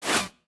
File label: Bullet Flyby; Redmatch 2